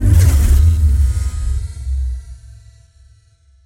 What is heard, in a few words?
Explosion Wave; KriptoFX; Realistic Effects Pack v1